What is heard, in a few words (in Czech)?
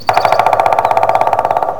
zvuk, ptaci